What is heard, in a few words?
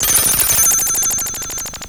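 FX left, attacks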